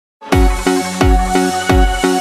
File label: theme song; markiplier